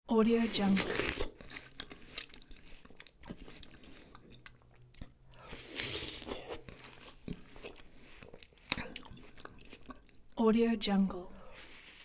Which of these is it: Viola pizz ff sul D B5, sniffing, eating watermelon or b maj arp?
eating watermelon